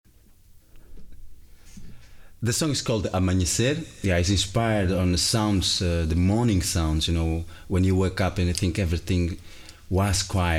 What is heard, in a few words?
bird song, acoustic roots